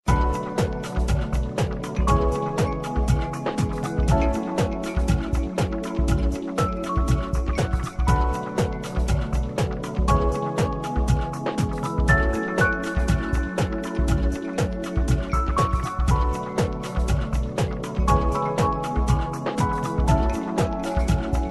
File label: Nokia Vertu